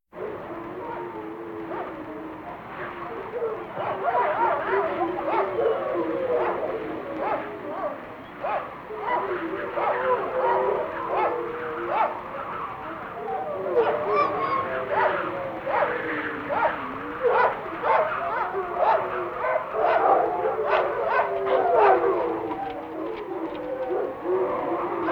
Kerkhof Met Huilende Honden, horror effects; KMP Film Video Sound Effects

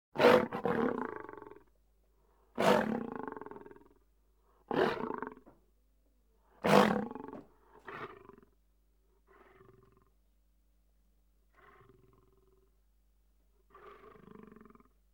Leeuw Gegrom; KMP Film Video Sound Effects

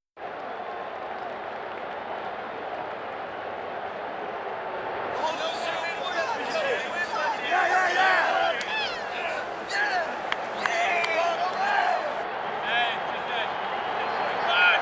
American Football Publiek; KMP Film Video Sound Effects

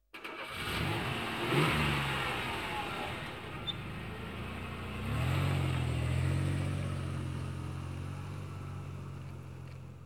Auto Start & rijd weg; KMP Film Video Sound Effects

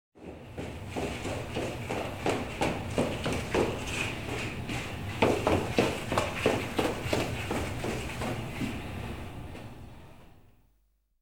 Trap Op; KMP Film Video Sound Effects

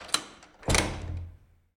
toiletdeur open dicht

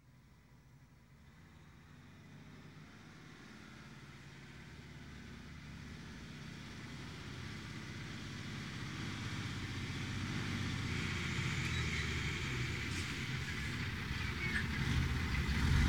Vrachtwagen - (zwaar) passeert; KMP Film Video Sound Effects

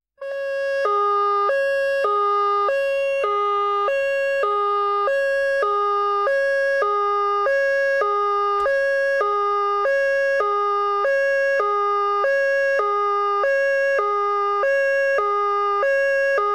Politie Sirene; KMP Film Video Sound Effects

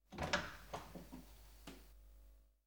Houten Deur Open; KMP Film Video Sound Effects

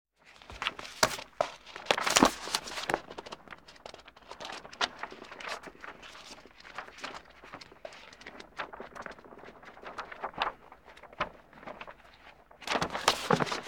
Opzoeken In Telefoonboek; KMP Film Video Sound Effects

